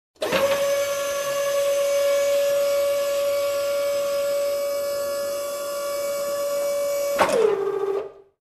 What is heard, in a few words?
Manufacturing Machine Navigating